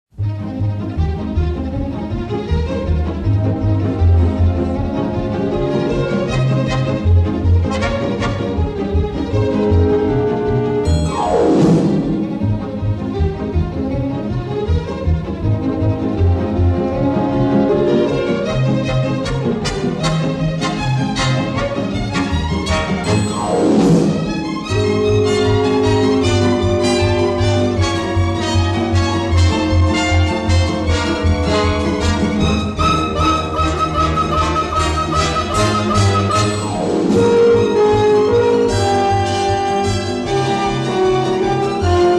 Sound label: Comedy Background Music